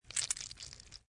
Blood Squish, Horror - Blood gushing, squishing from open wound, or knife or dagge; knife or dagger being forced into neck, flesh ripping; Injury